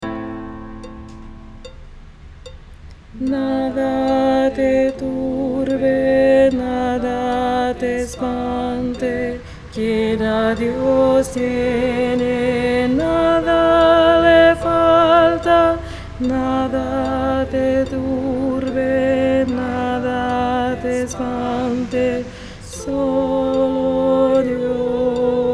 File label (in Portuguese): contralto